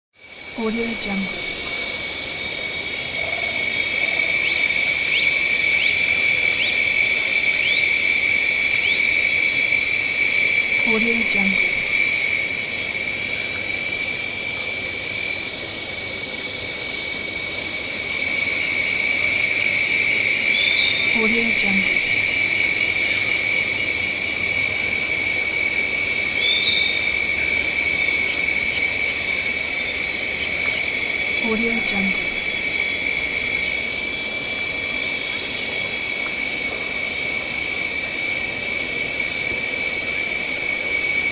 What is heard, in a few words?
swamp